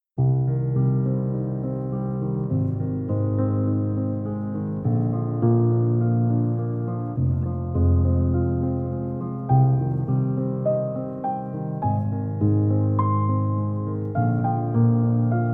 Piano Dreams, Concentration Study, Piano para Relaxar